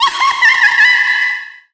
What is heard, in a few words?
Queen Of Fairies Laugh Clap